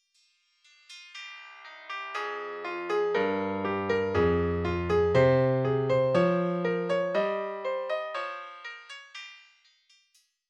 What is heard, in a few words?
Highpass